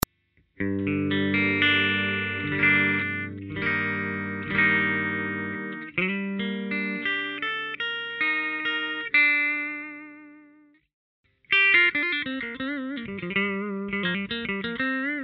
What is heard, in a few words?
Sansamp Tri Ac